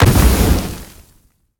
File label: stomp; smite; pele